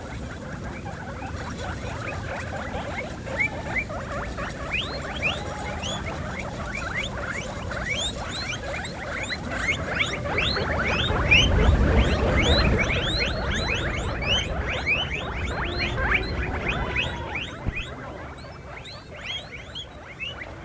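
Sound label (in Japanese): morumotto, テンジクネズミ（モルモット） 鳴き声; 多摩動物公園; 野生種であるテンジクネズミを家畜化した動物。; 「キューイ」「キー」「クイッ」「クルクルクル」など、さまざまな表情の声を出すことが知られています。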